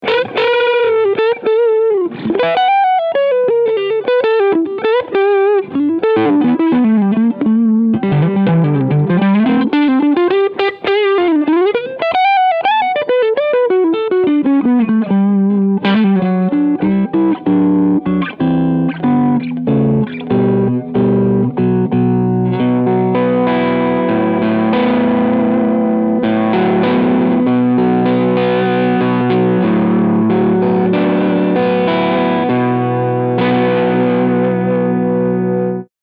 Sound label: Overdrive EQ OFF